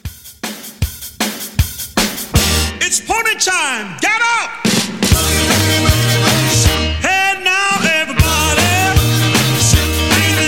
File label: 60s Pop Re Drum 156